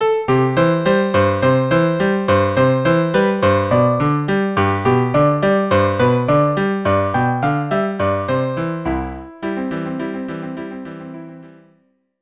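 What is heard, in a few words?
violin cello; Dreidel song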